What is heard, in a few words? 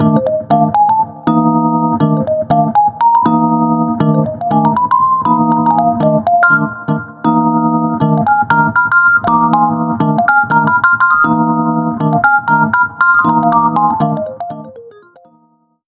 electric dyno 80s tvman; electricdyno mkv 2.0